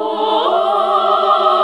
SING, CLASSIC VOX1; Best Service; Hallelujah

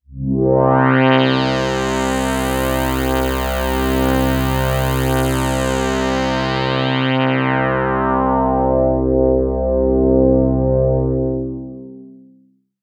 pad C3, PPG Wave 2.2; Pads

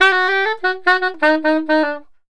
SAX, Balkanish Traditions

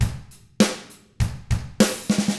ROCK, Drums; ILIO; Double Platinum Drums 1

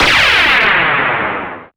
Noise Hit; Roland MV8800; SONIQ DRUMS

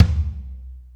ROCK CLUB, KICKS

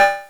Drums, Hits; DCM8

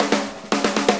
Fill, Drum Loops; Drum Tools; Bruford; Sonic Foundry (Sony Creative Software); Various Loops

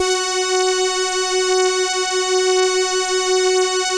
ELKA, String Machines; ELKA RHAP

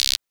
Checkmate Perc, Keio Checkmate

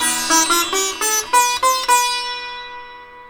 SITAR, Indian Traditions

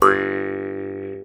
MT G, JHARP G B; Xsample VOL-9

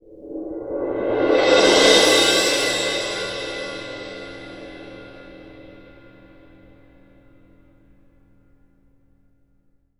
CYM CRS L, Synclavier Percussion; ILIO; Synclavier Percussion 2; World & Orchestral

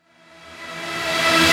Reverse Synths E; Vengeance - Essential Clubsounds Vol.4

